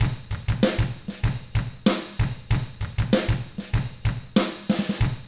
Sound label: HARD ROCK, Drums; ILIO; Double Platinum Drums 1